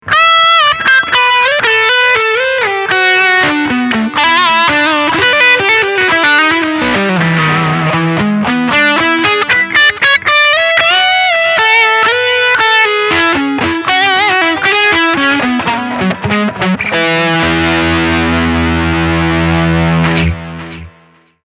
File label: Distortion Delay